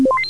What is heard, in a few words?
menu